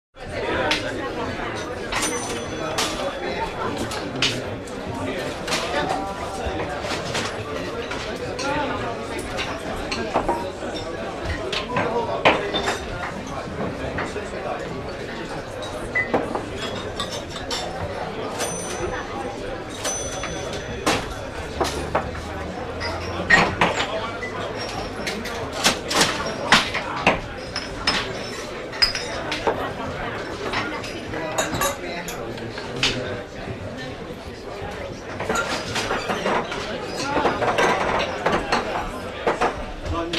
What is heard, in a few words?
Bar; Pub Atmosphere Daytime. General Chatter, Bottle Chinks And Cash Register.; bars & discos